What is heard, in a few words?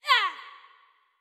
Charge Release; Jackie